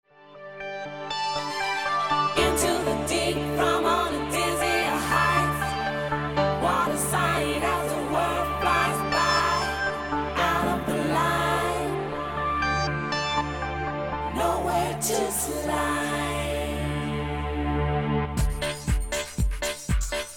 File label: Scat and Sax